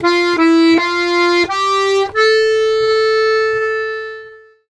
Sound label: Accordions; USB Soundscan vol.40; AKAI